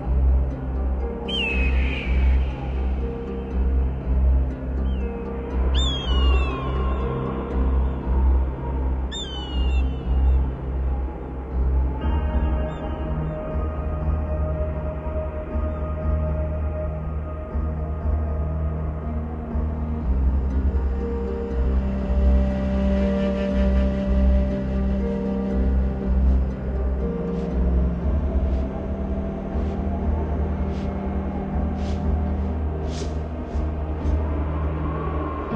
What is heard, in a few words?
ambient; Fenix Play 27 Deluxe; Wazdan